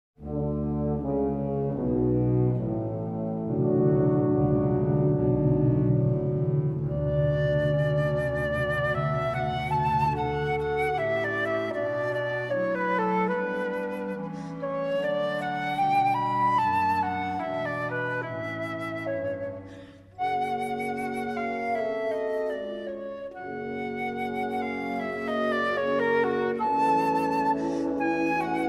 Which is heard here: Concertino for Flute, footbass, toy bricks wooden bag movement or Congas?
Concertino for Flute